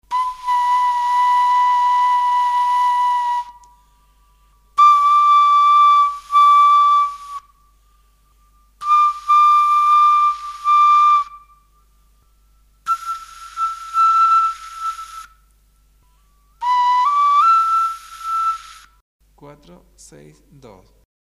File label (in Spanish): flauta globular